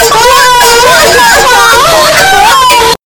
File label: backstab; ff2